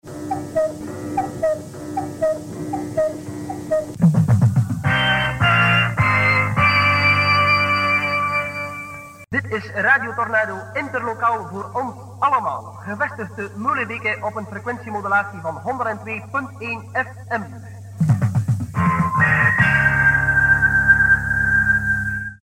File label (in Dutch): Tijdsein; Tornado Meulebeke